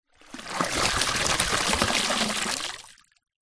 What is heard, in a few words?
water pour into deep water